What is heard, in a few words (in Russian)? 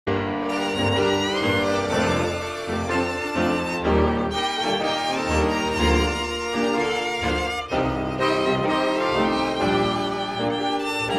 tango krasivaya muzyka dlya tango